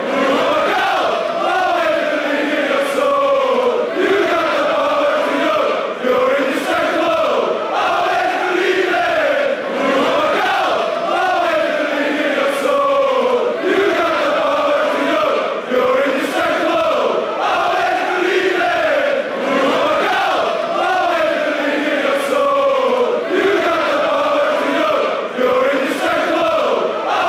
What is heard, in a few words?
you are gold, fanchants